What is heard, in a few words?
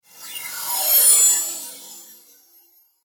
Electrical Whooshes, Science Fiction; 5.1; BlastWave